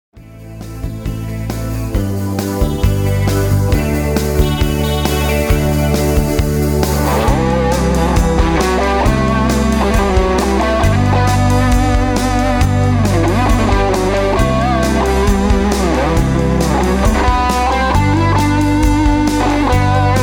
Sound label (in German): Solo